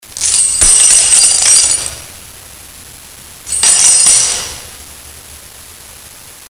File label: jingle